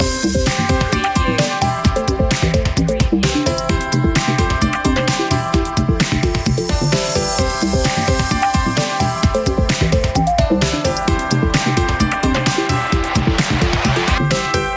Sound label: electro cool loop; media music now